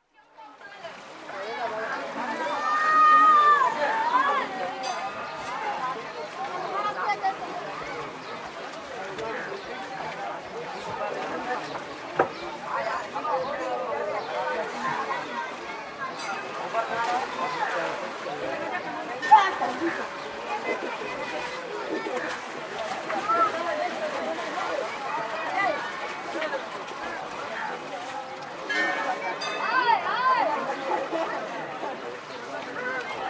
Benares: Bathing ghats, atmosphere with splashes & shouts, with distant bell; City; BBC